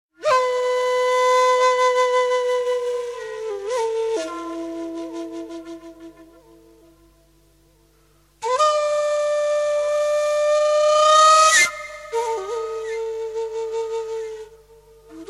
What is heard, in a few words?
Mysterious flute